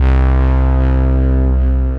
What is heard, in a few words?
Bass Valley; Drive and Glow; Ableton; Factory Packs